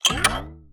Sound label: Retro Weapon Reload Plasma